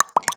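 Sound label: FX Bubbles, Beat Box; aawaz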